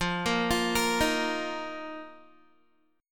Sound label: F 7th Suspended 4th F down Guitar; arpeggiated